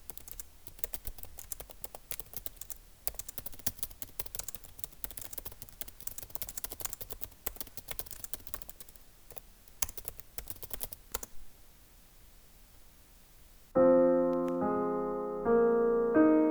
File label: keyboard kid